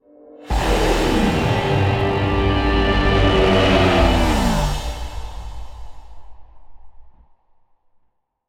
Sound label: jingle boss entrance; blightbound; xbox series xs